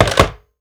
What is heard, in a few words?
phone hang up